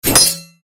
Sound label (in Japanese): 剣 モノラル